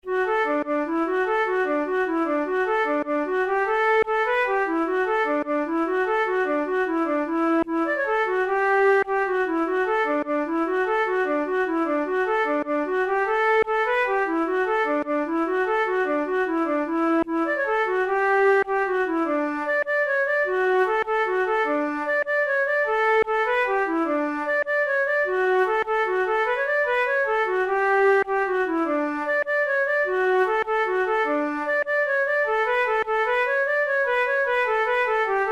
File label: jig, Trad. Irish, Flute